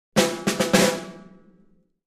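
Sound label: Drums Snare March Accent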